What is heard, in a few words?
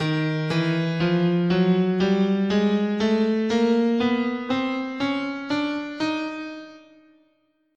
D sharp Chromatic, scale